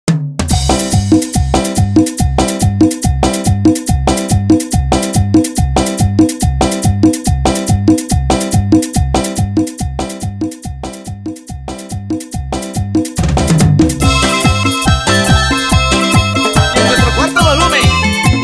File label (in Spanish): Intro Simple Bpm 142